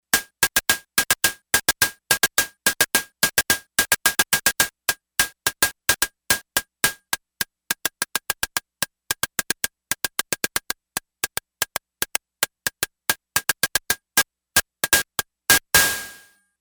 Hi Hat, Yamaha DX11; Factory Preset Voices

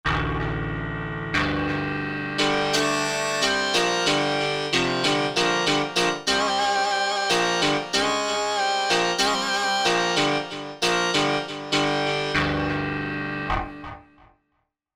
Fifth G; Korg MSC-1S Variety

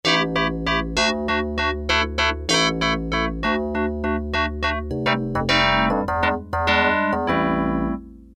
Dyno Wurlie, Yamaha TX81Z

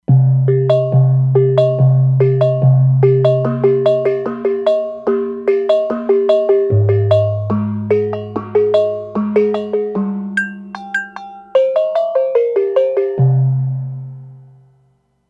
Brite Bonang, E-mu Proteus-3; Factory patches

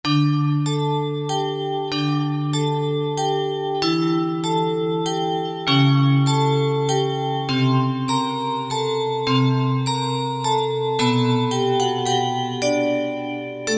Chime Bells

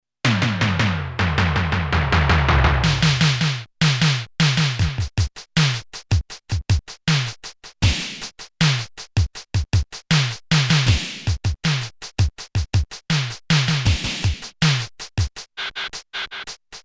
Elec Drums

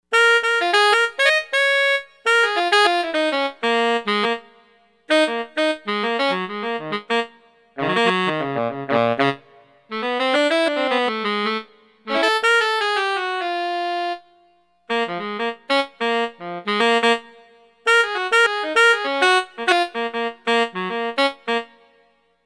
Fast Solo Tenor

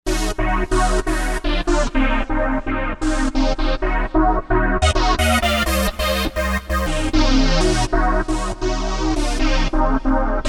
TeknoLead; Factory Patches

